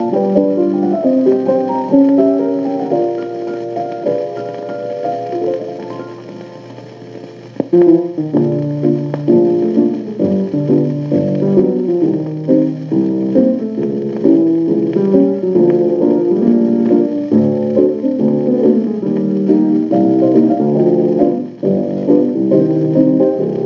Piano Medley

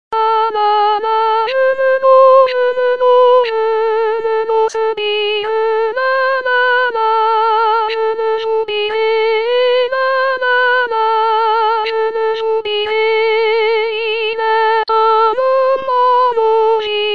Soprano; Certon

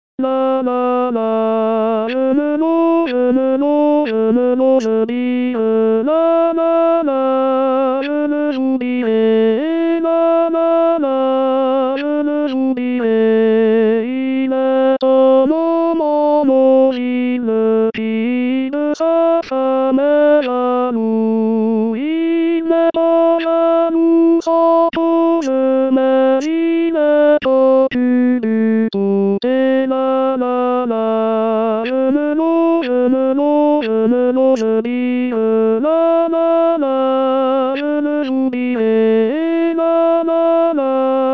tenor; Certon

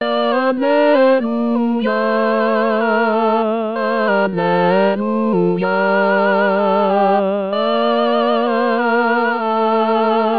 Tenor

